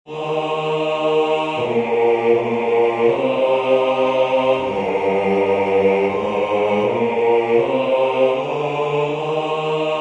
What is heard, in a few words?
Bass; Abide with Me